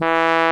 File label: POP BRASS